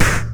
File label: snare fx, DnB Breakz Kit; Breaks N Beats Sound Kit